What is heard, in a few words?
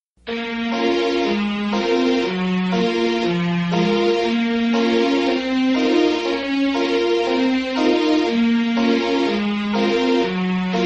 Instrumental Slow Jig